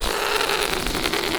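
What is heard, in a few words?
Balloon deflate reverse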